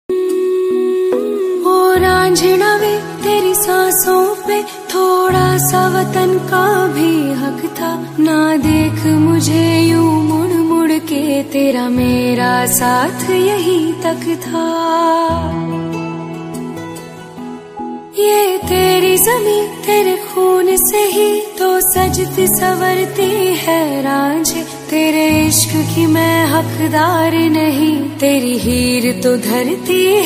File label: Female Version Ringtone